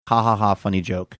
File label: hahaha funny joke